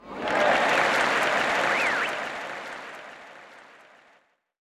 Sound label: applause; HeavenStudio; theDazzles